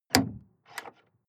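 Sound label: sports car exterior bonnet unlock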